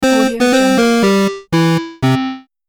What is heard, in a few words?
game over sound